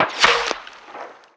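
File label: ricochet concrete, weapons; firearms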